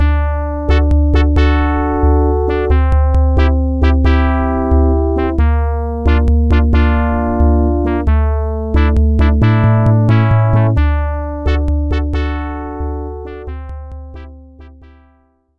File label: pc salute; wolfenstein 3d